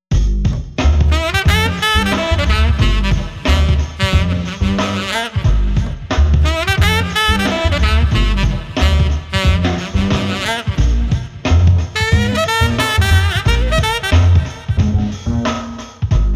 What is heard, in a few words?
Street Cool Sax Trio 90